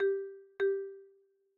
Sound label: ringback; nheko